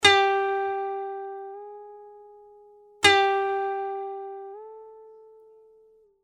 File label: koto